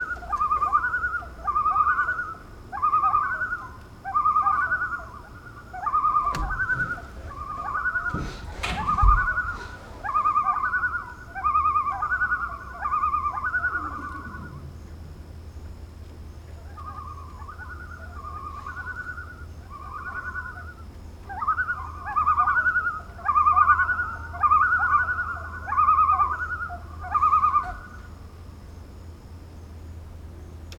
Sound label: loons near shore